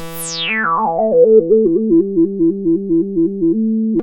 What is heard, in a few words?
EASY SYNTH, Roots Disco; USB Soundscan vol.32; AKAI